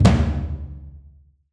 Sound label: Mid Perc; Drums; One Shots; Ghosthack; Drum Mega Bundle Demo; Quantum Force 4; Blind